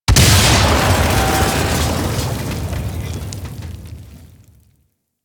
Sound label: Toxin Machine Explosion; Missions; Raid; Grn Bomb Objective